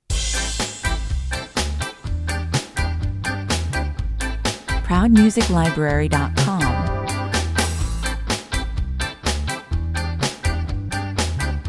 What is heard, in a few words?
rainy day ska, 125 bpm